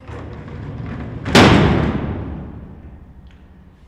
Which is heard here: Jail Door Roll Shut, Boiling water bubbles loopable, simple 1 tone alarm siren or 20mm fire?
Jail Door Roll Shut